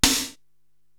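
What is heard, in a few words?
snare drum Thin Rock Gate, Drum Machines, Korg TR-Rack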